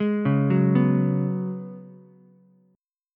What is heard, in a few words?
F sharp Suspended 2nd Suspended 4th, Ukulele; arpeggiated; JGuitar